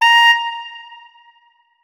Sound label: notes, saxophone; Hideout Furniture